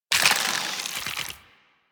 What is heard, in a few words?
Draw; Warframe; Infested; Derelict Cernos Prime